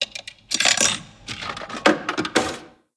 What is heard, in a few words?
Vending Machine; blue archive